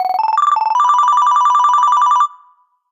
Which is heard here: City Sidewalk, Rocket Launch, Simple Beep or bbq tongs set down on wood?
Simple Beep